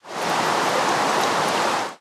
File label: rain, ambient weather